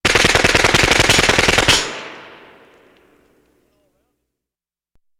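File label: Machine Gun 9mm Rapid, bursts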